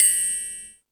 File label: Space Drums, E-MU Pro-Cussion; drum machine